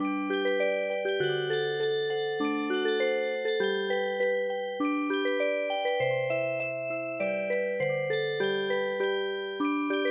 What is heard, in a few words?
powdery snow polka Music Box